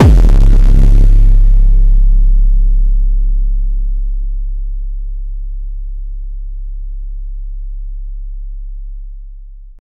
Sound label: light robot footstep